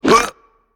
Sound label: Character Moustache Hit; Angry Birds Epic